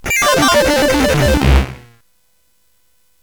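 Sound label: sfx, MOS 8580; hvsc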